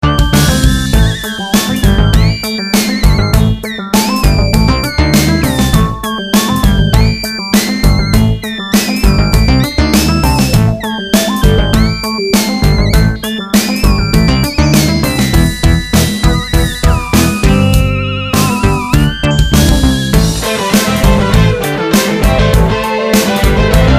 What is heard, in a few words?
Whiny